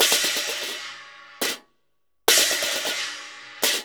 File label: CHINA LG, CHINA CYM; Spectrasonics; Heart of Asia